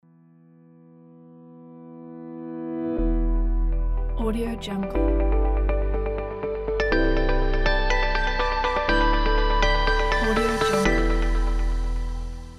logo ambient

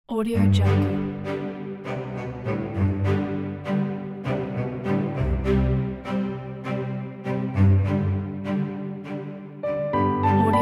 magical orchestra

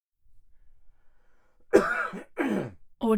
clear throat male cough various versions; prosoundfx

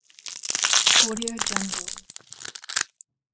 bone breaking

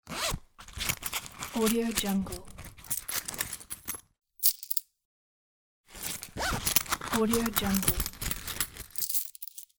picking coins from wallet

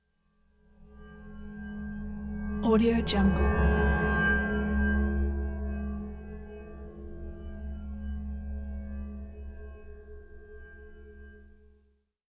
tonal ring deep slow humming pass by keening

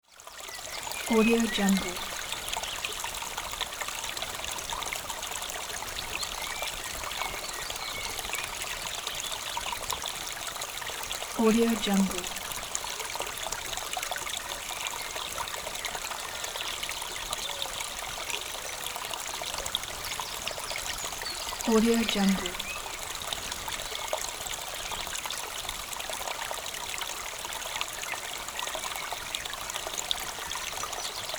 creek

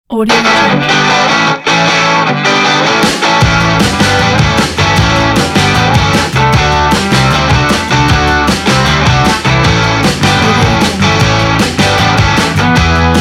60s rock pack

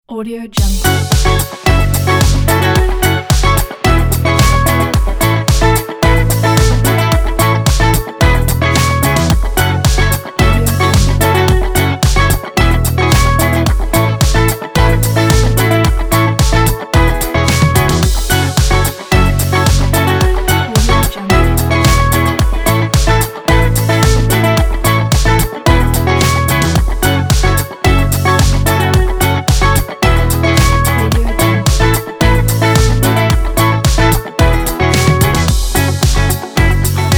feel good indie

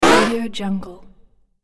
platform hit

